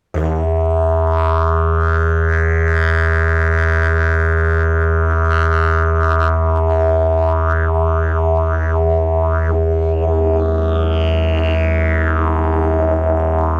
jilm klokan, didgeridoo

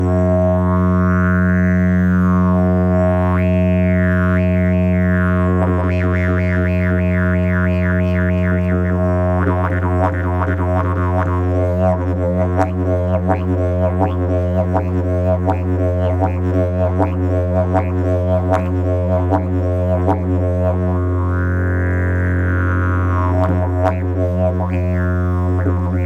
olse, didgeridoo

